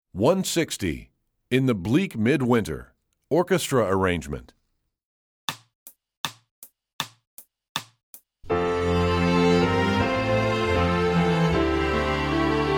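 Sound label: Orchestra arrangement; In The Bleak Midwinter; EE2000 Book2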